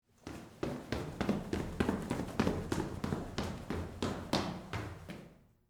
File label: Stairwell Run Down, Footsteps, Stairwell, Run, Down, Reverb